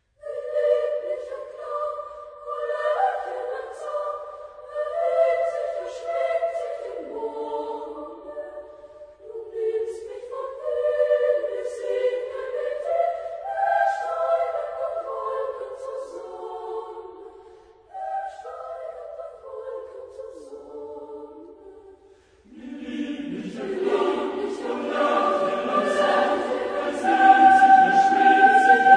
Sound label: Lieder, chorus; Mendelssohn; 6 Lieder Op48; Lerchengesang